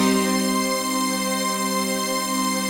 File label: CHRD PAD; Best Service; XX Large Pads; Sample CDs